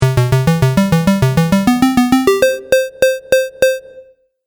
Retro Game Melody; Video Game Sound Effects

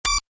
Tech beep, Beep, High Tech; Stuart Duffield

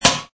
Metal Ding; Metallic

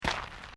FOOTSTEPS SNEAKERS GRAVEL FINE STEP; Footstep Foley; Human

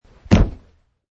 Landing; Man wearing jeans and hiking shoes jumping and landing on carpet surface - variation 17; Wrestling and Struggling; Michael Huang

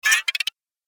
Alien Android Power Up, robot; Robot Sound Effects; Andrew Fleming

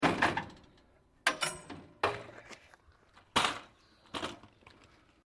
Trolley Remove From Truck, Domestic trolley jack; Machinery; Machines

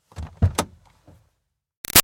Car Highlander Door Interior Open; 2005 Toyota Highlander V6; Cars; Vehicles; Big Room Sound

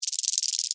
Animal, Beetle, Shake, Wings, Insect, Bug; Animals

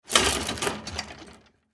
Chain Rattle Move; Jangling; Foley; Objects; Blastwave

